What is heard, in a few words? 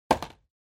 Calendar (Put Down)